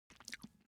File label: animal cat eat single; smartsoundfx